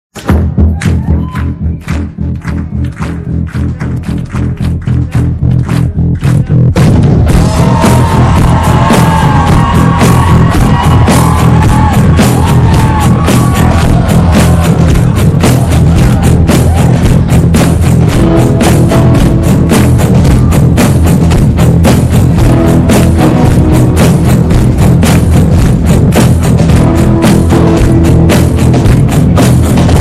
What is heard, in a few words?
background Music